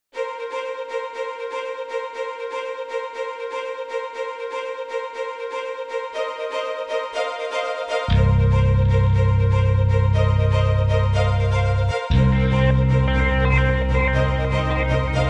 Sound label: Suspense Action, Tension Action